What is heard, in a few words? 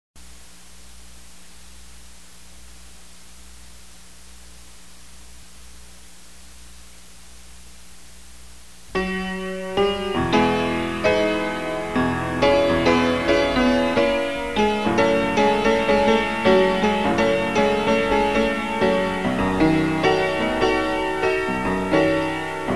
PIANO